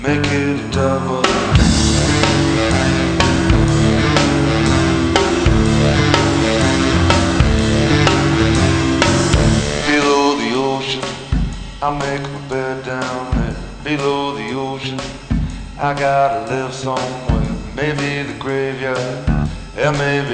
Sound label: rock